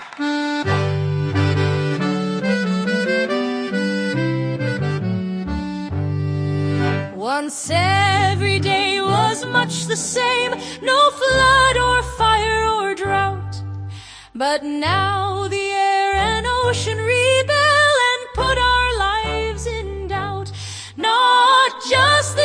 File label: Forest Green Climate Song, folk trio